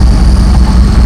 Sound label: huge v10 small, engines; ACF CustomMod